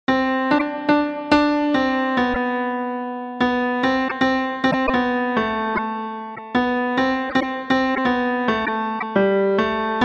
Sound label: piano